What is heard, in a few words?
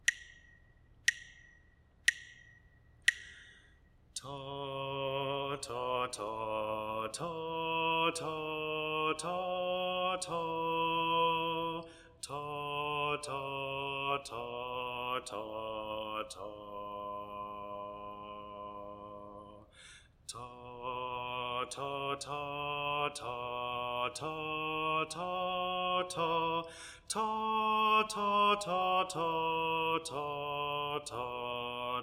melodic dictation; eartraining